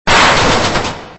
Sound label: Smash Metal; Extra Lives